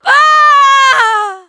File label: death